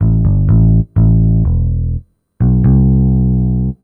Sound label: DEEPHOUSE BASS 125 A